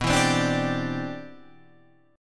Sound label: C Major Add 9th, B, guitar chord